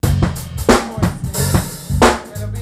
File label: 90.5bpm key G2 w. bass, Hip-Hop Street Beats; Arty; Sonic Foundry; Hip-Hop Street Beats Construction Kit; Street Beats by Poogie Bell